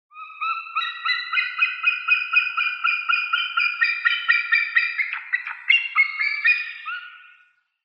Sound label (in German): Seeadler Haliaeetus albicilla